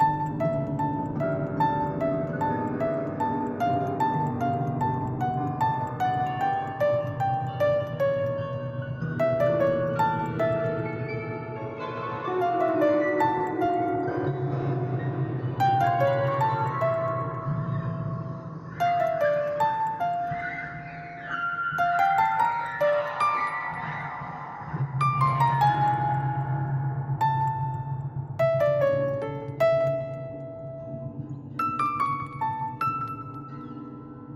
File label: piano loop